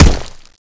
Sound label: kick stone